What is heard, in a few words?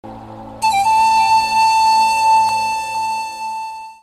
Pan flute